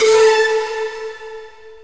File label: asia flutey blast; Flutes